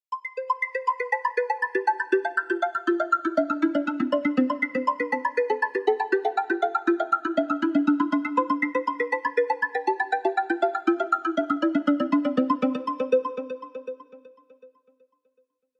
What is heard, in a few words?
Subtle Alarm